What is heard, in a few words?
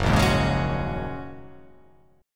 Gb 6th Add 9th Gb down